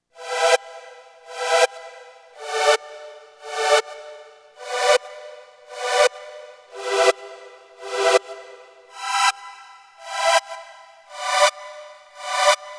Korg EK 50 L Off Beat Pad

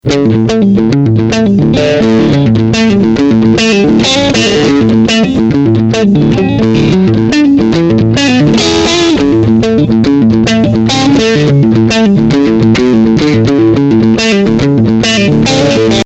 medium drive shuffle

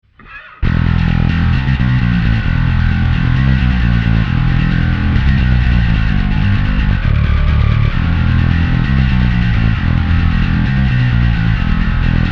Darkglass Microtubes X7 Full On active

